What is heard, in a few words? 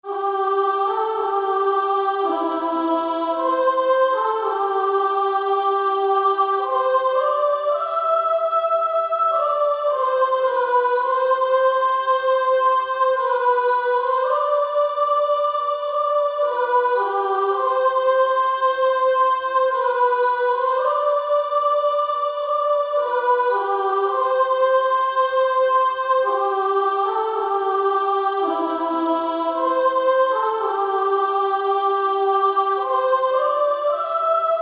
Female Choir S2; Rock of Ages